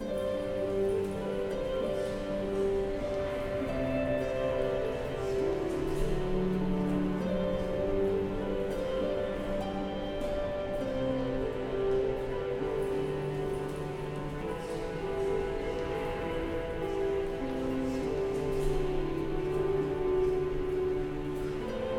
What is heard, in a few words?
Ambient; Sir Tincan Adventures in the Castle; Anziehen